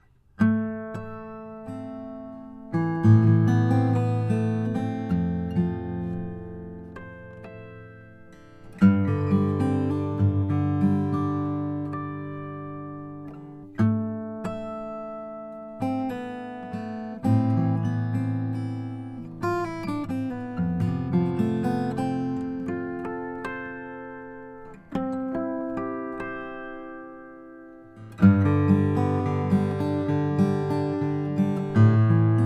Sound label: wingert e cutaway macassar ebony